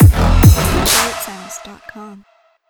dub follower

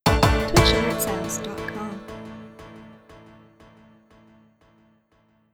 Simple Raid

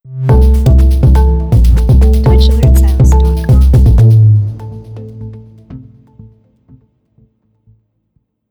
Trap Cheer

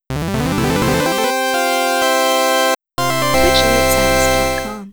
8-Bit Donation

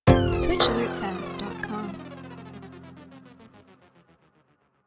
Simple Raid

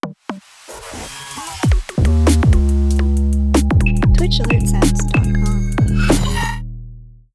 Trap Host Sound

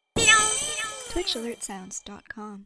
Anime Cheer

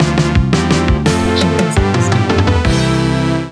New Wave Cheer Alert

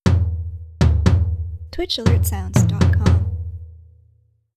Jungle Cheer

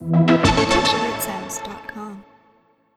Dub Donation

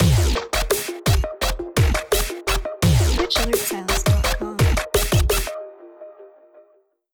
Dubstep Subscriber

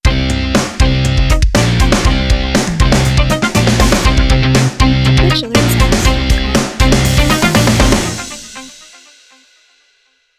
rock sound raid

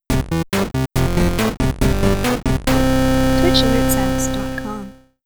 8-Bit Follower

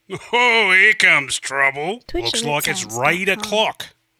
Aussie Raid

